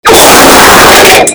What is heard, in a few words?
wet fart earrape